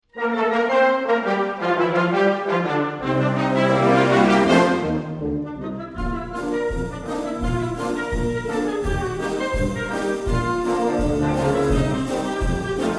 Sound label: old jazz history ragtime